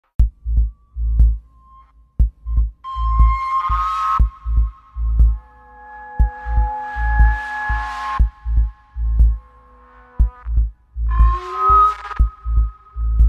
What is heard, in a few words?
Scary background; پس زمینه ترسناک